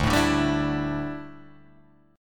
D Suspended 2nd Suspended 4th D down Guitar Drop D